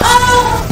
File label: far Wild